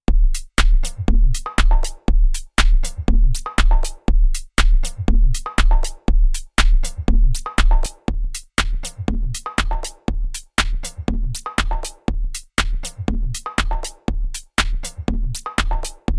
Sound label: Camden Line HPF Thumb